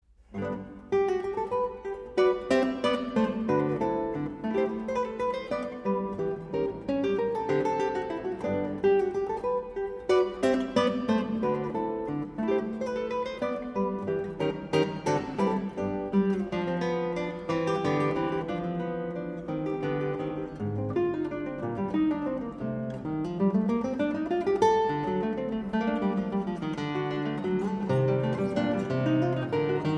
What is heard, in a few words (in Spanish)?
guitarra